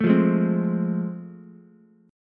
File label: A Minor Major 7th Sharp 5th, Ukulele; strummed; AmM7#5; JGuitar